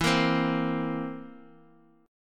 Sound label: A sharp Suspended 2nd Flat 5th E